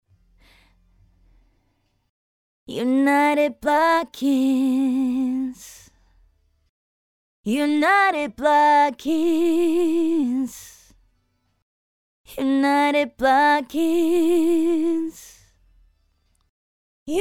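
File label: female voice dry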